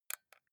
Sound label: console controller xbox joystick press